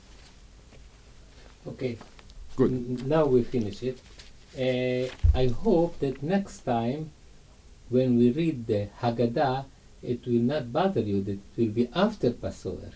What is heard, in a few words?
closing note; Pesah